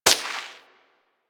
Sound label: Bullet Whizzby; Warframe; Weapons